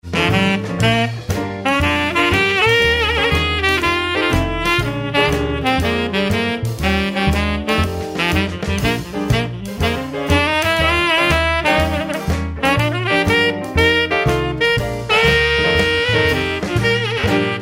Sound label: sax, jazz